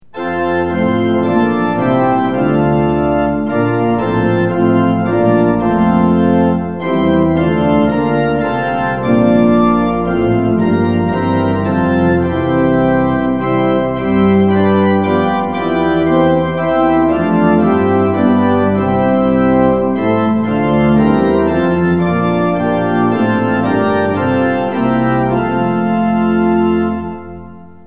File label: tune; hymns; lillenas